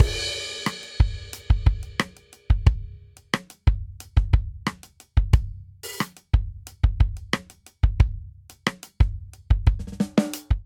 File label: Stadium Rock Intro 90 bpm; 4 4; MIDI Clips; Ableton; Factory Packs; Drum Booth